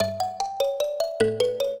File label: trio, mbira; Mozart